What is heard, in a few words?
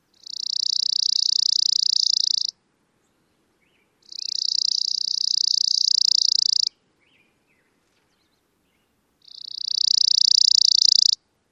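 Chipping Sparrow extremely fast-pulsed song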